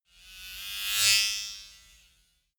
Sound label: servo small whoosh